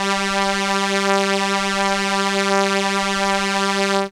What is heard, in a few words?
Viola G3, synth, ARP Solina; musicradar-synth-samples